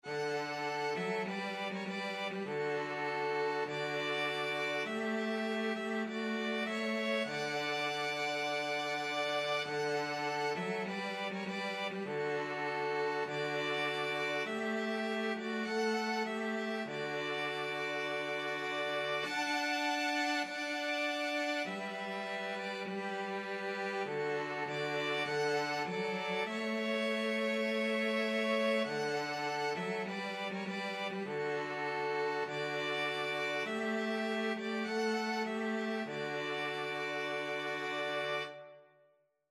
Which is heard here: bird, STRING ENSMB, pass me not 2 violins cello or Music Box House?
pass me not 2 violins cello